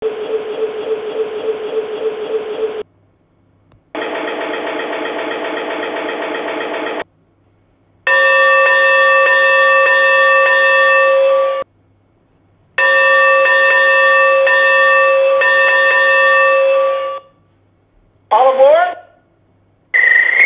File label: electric sounds